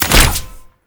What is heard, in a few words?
Bow Shot; Grineer; Weapons